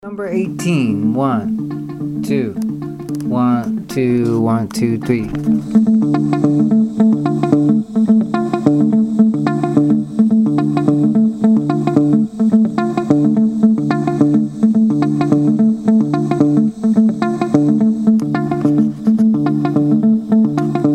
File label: mozambique patterns